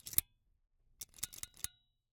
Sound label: Ice cream Scoop, metal, mechanical, teeth, gear, squeeze, triple release; Eiravaein Works; Vaeyan IV